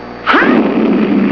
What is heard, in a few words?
Haaa